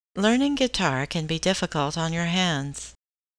guitar

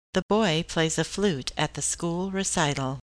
flute

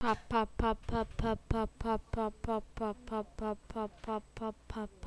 pop

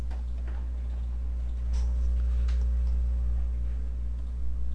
rain

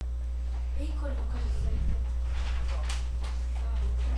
impact

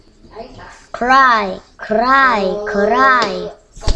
cry

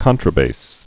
contrabass

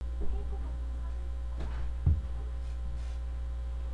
deer

